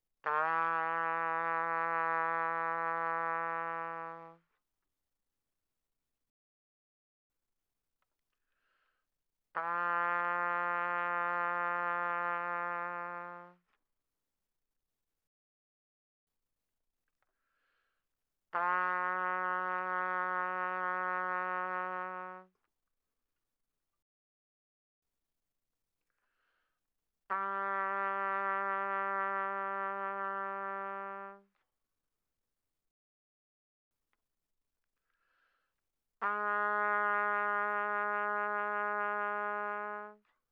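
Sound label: Trumpet vibrato pp E3 B3; Brass; Bbtrumpet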